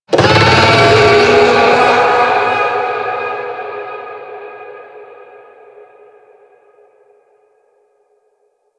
Infested Golem Vocal Attack; Warframe

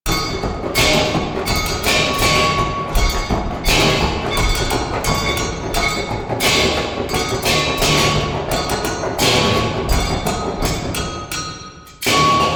Music Exterior, Ambience; Vent Kids Skeg; Warframe; Venus Open World; Venus Solaris United

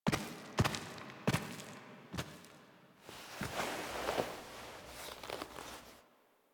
Foley Enter; Cinematics; Operator; Warframe; The War Within; Mountain Pass

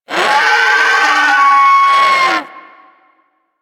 Bird Of Prey Lure Call; Warframe; Hunting Critters

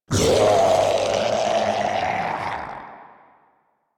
Death; Grineer Ghoul Devourer

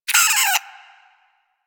Vocal Pain, Hunting Critters; Warframe; Cryptilex

